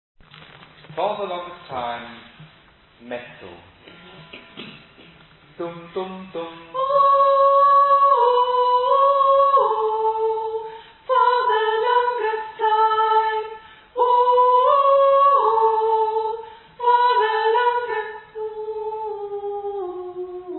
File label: Mezzo